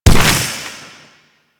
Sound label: Arcane Melee Air Proj Death; Mods; Proc